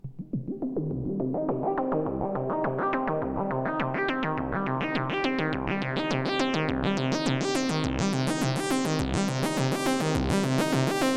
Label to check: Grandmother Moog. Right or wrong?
Right